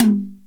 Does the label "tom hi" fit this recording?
Yes